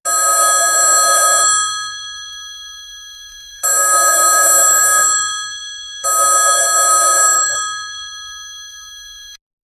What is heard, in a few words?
the sound of an old, 1980s